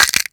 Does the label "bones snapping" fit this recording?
Yes